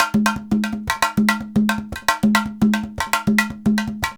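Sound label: emelabo grooves; Best Service; World Colours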